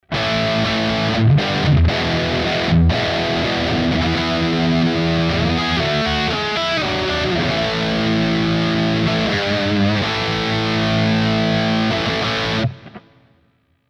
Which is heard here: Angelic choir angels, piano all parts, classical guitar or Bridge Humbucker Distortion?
Bridge Humbucker Distortion